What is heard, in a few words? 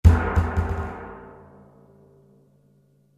Bounce Barrel